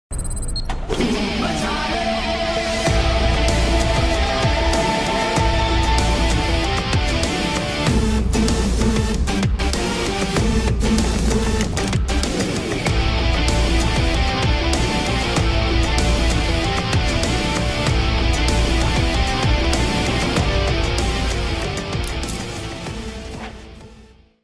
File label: movie background music instrumental ringtone music; dhoom